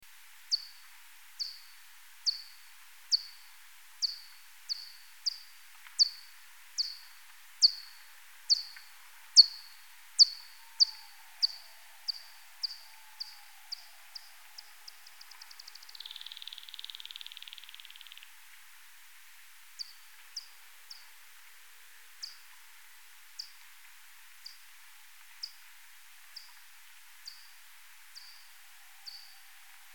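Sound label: Vespadelus darlingtoni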